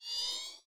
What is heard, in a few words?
Zoom Chunk; Insanely Twisted Shadow Planet